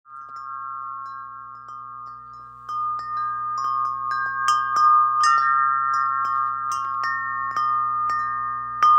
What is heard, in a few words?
36in chime; carson signature series